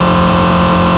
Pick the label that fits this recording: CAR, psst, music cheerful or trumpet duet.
CAR